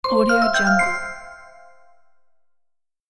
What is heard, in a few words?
fairytale magic